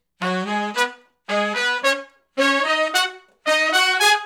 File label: R&B Riff (Ab), Horncraft, Sections